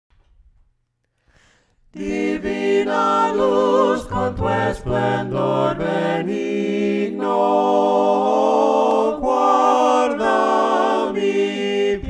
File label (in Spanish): VOCES, himnos a capella; cantos del camino